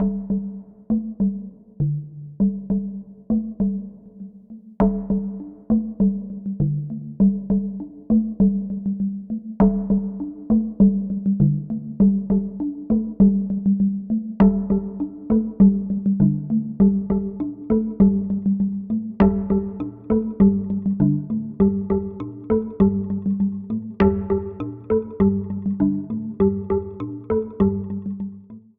Drum Loop

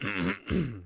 Cough

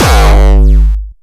Dark Kick

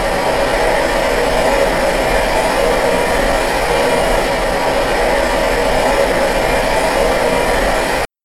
Jetpack Low

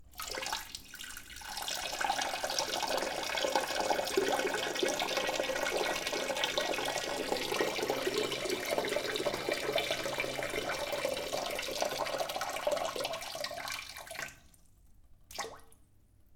Pee

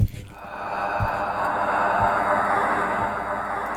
Ghost Noise

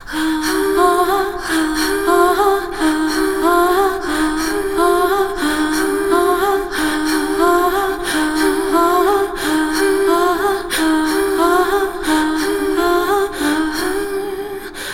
Vocal Seesaw

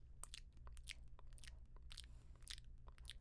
Eating meat

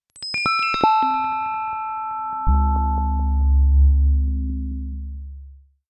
Dong

